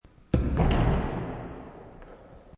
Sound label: Basketball Backboard, Basketball bounces off backboard; Sports